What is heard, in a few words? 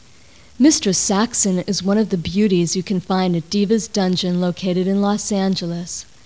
saxo